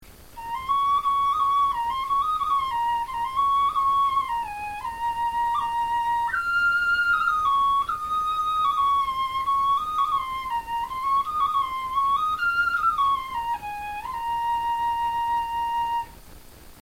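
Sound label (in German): melodie, Knochenflöten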